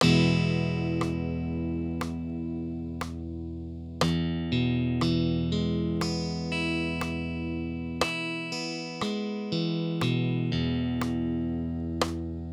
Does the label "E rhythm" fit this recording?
Yes